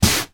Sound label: foot slide on carpeted floor